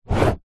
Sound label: pen ball point write single stroke on empty card box; office